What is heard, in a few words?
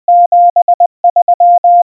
73, --... ...--, Морзе; Наилучшие пожелания (радиолюбители); Генератор азбуки Морзе